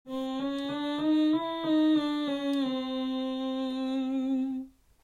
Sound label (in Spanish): Afinacion laser